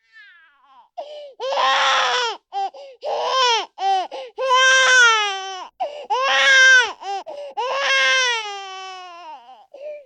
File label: baby voice; anemoi